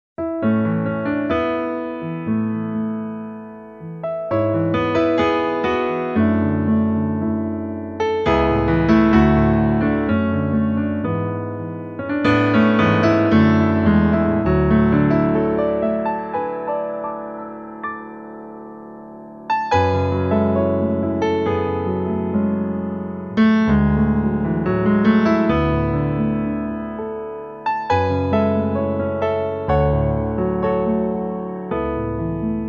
Kawai; EXpressive

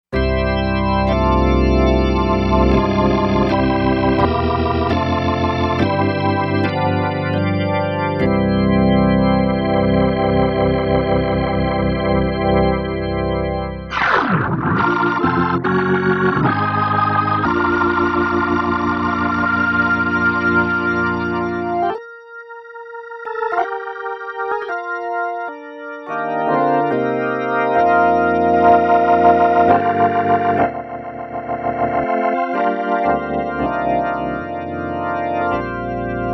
Full Rock Organs

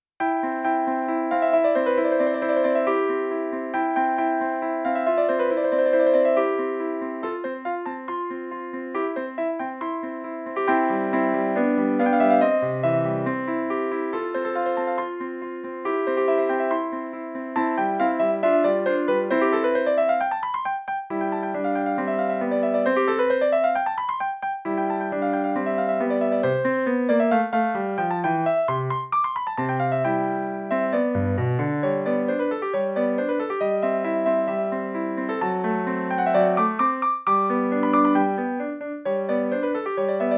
Triton; EG Triton 64Mb LE; Mozart Son10 Movt1

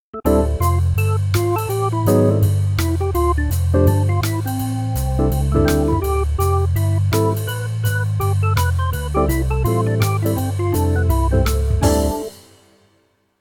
Jazz Mix

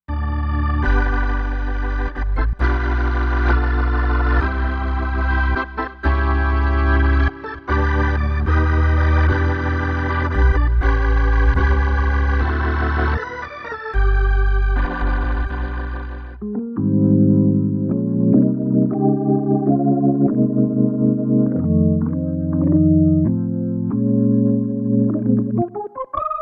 Org XS Gospel Organs

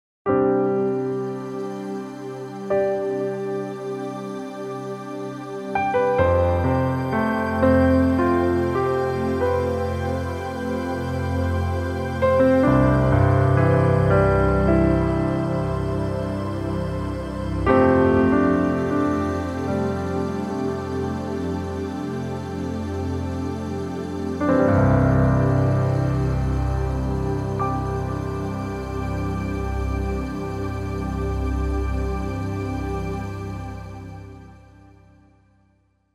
Piano Pad

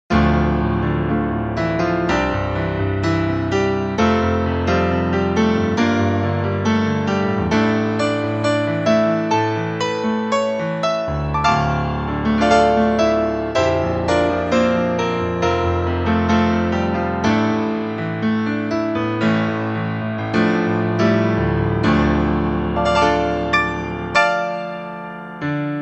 Aggressive

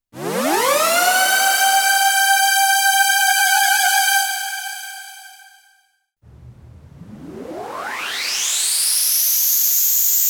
Live Worship Risers

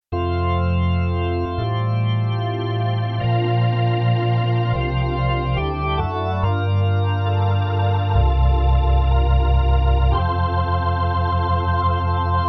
Kronos OASYS Ballad; Organimation

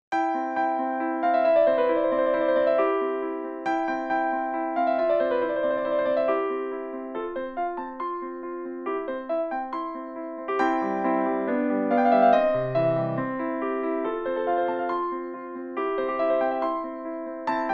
Montage Small; Mozart Son10 Movt1

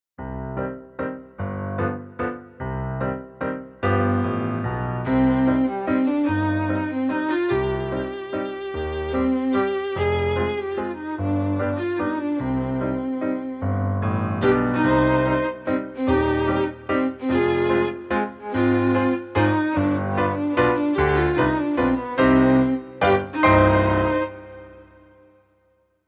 Tri Class Mix

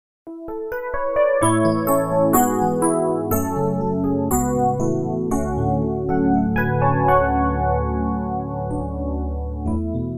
FM EP 8 Vel

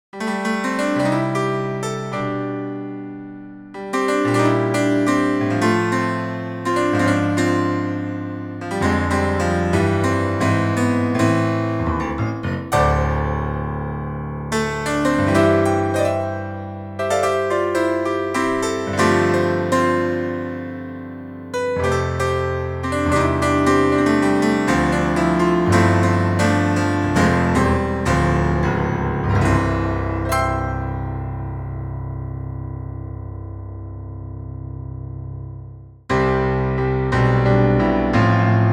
Epic Grand XS and XF Small Bright

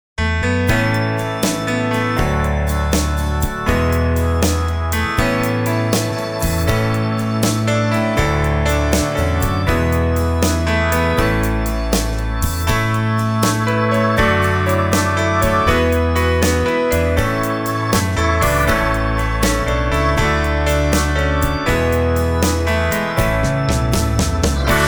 Country Shuffle

